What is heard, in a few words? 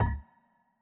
reel Bounce; netent